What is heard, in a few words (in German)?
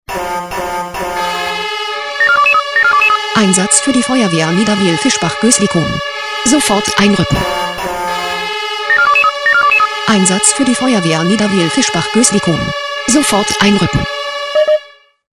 SMS Alarmierungstone